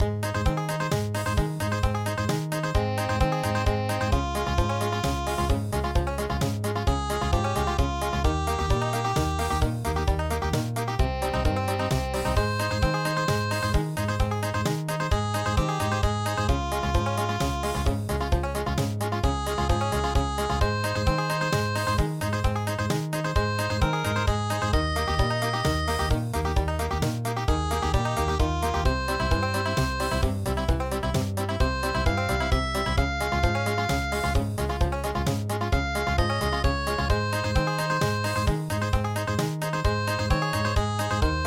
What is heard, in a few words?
Country